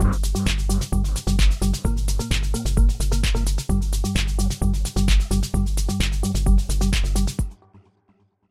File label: stab with delay; Tech House; Dark Angels